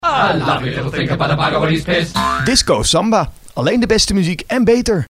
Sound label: samba